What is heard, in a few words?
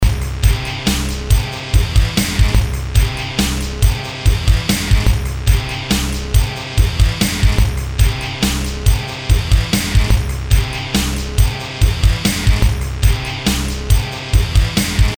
hardrock beat